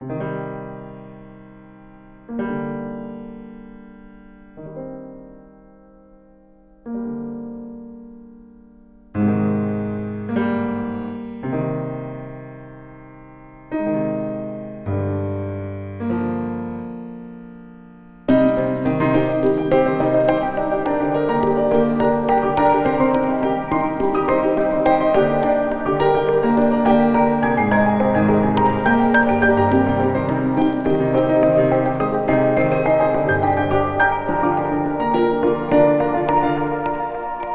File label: piano chords